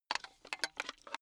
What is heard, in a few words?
metal pipe handling low short